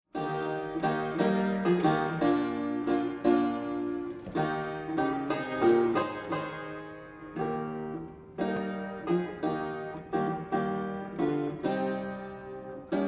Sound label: Square Piano; Gadsbys